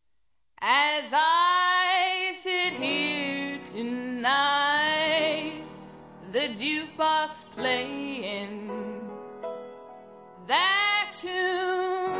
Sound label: honky tonk